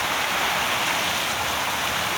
Fountain; Levels Hub Restaurant; Fountain Close

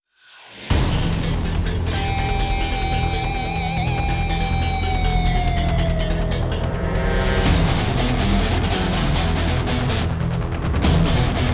Tactical Action (Battle); Xenoblade Chronicles 3

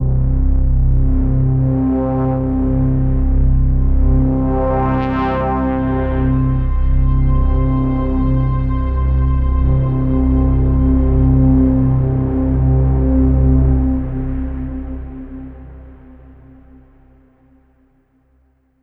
Overlay Drone; Act3 Rezoning

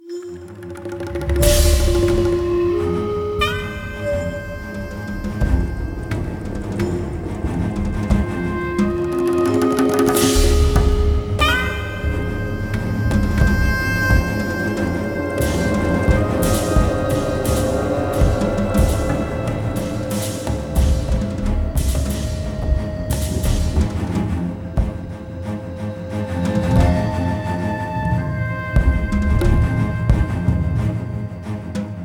nightjungle

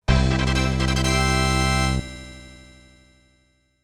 Treasure Box; Hiouden; Superfamicom

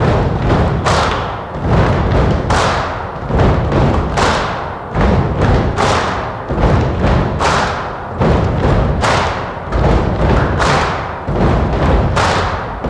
Music Sudden Death; Dungeon League

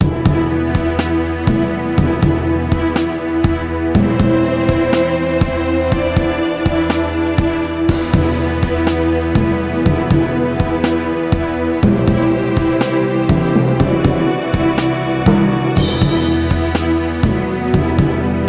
Win; Fort Commander Kings Gambit; psp

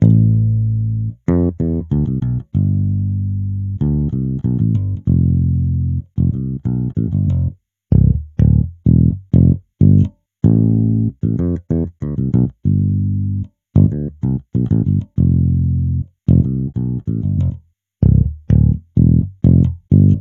Elec bass

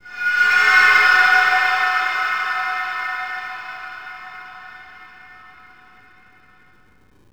Hint Recharged; Abyss The Wraiths of Eden

